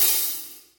focused open hat one shot a sharp key, open high-hat sample tuned to the A# note